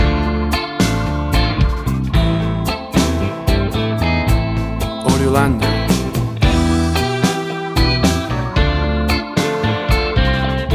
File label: Blues Tango